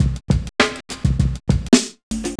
loop, Roland; Sound & Vision - Gigapack I

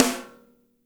SNR; Spectrasonics; Burning Grooves

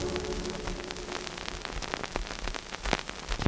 Vinyl Old 78, Noise

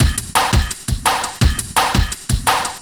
Classic Beat, Drum N Bass

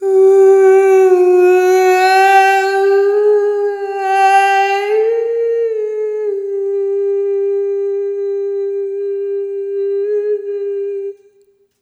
Arrhythmic G

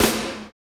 SNR FLAM, Snares; Roland - Rhythm Section; Snares 6; Sn Modules 6

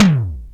Drumulator E Tom Lo, Emu Drumulator; Drums

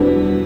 Jazz Hit Soft; Misc

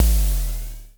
EBASS NOIZ, Dual Percussion Synthesiser; Klone

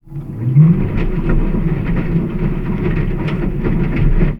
Washing Machine, contact mic, mechanical

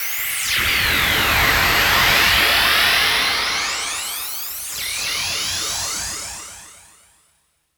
SPACE-FX

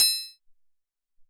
Triang

